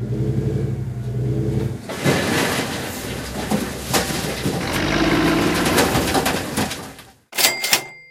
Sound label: House; RS Games Sounds; Monopoly